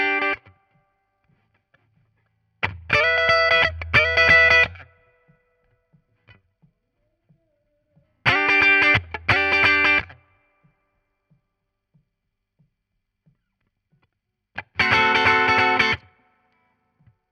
Guit Solo, RNR for piano

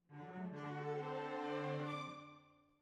Cellos

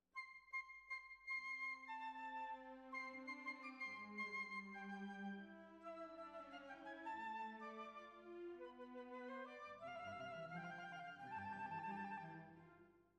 Bassoon, Jazz for piano

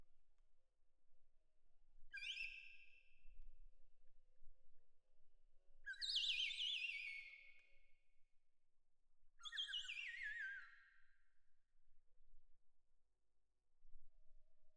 Perc Main

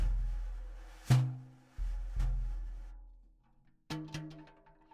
SNR Bot

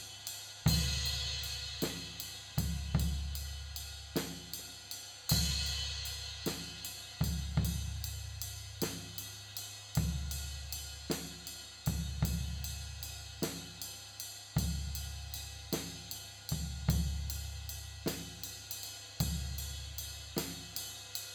Floor

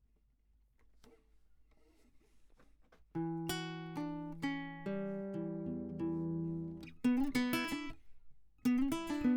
Classic Guitar

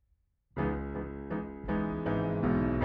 Piano Mono; Piano Workshop Rec

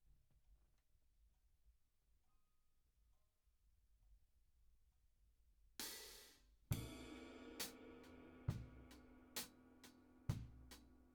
Drums

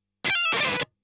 EL Fuzz End

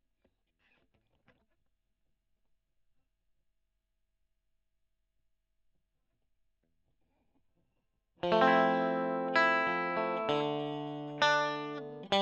E.GTR Gefell

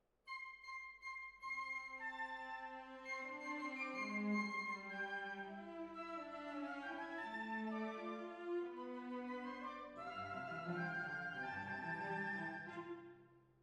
Hall, Jazz for piano

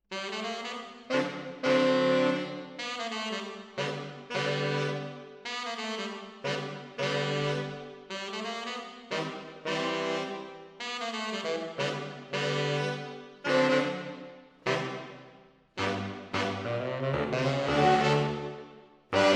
MKH AB, piano

